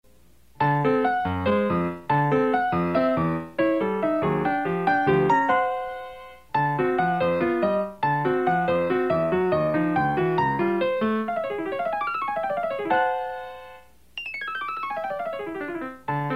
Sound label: piano